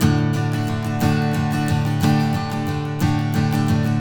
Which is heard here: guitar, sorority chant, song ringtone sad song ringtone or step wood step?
guitar